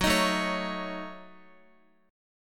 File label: F sharp Minor 6th, Guitar; F#m6 strummed; x,x,6,8,9,9; 4F,4A,5C,5G,5C,6E; chord shape